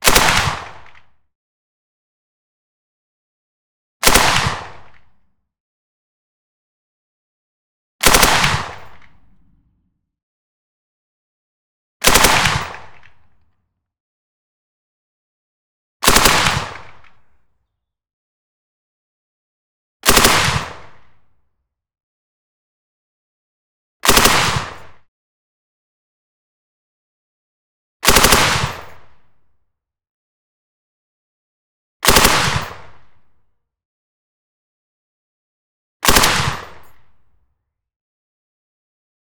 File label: HK MG4 5.56mm 1m left off axis clean Bursts; Heckler & Koch; MKH416